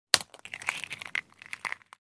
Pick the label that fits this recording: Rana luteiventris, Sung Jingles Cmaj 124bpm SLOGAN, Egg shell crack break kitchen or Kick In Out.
Egg shell crack break kitchen